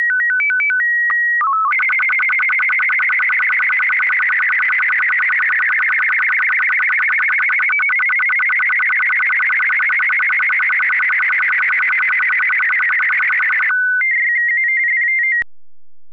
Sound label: sstv